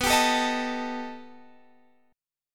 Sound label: B Augmented 7th B down; B+7; strummed